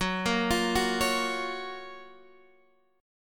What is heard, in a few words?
Gb Major 7th, down, Guitar, Standard; GbM7 arpeggiated; JGuitar